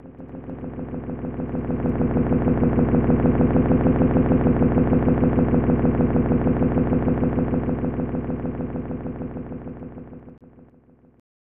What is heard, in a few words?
Helicopters; The Simpsons Bart's Nightmare; SNES